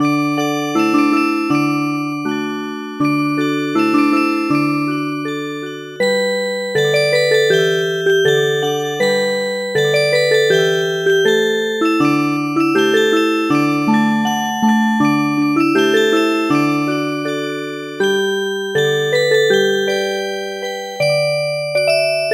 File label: madder color pass Music Box